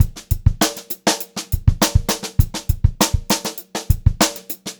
VAR, Funk-Groove Drumloops

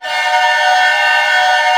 cluster, Orchestra; Sound On Sound - Peter Siedleczek's Orchestra

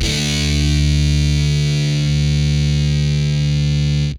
POW CD FUZZ, Guitar; Quantum Leap

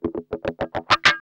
GUI, licks

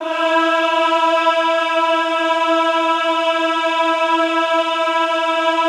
EHH E3, Classical Choir; Best Service; Extended Classical Choir; EHH MIX

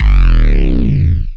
BASS, 168 BPM; Ueberschall; Drum & Bass Flash Trackz

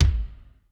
ROOM K, ROOM KITS; Crypton - DrumBase5000

